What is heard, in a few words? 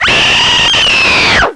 Dismembered & Screaming; Solarian II